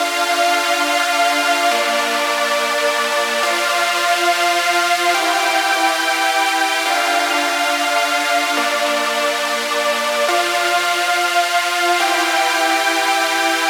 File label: Pad, 140 BPM; Vengeance Samples; Song Kits; Take Me Away